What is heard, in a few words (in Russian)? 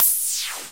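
кнопка цифровой шумный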